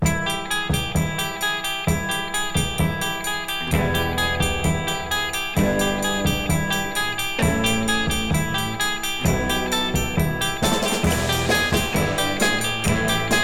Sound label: rock instrumentals